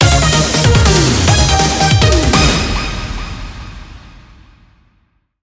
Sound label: Bgm Gameover; Busou Shinki Armored Princess Battle Conductor; arcade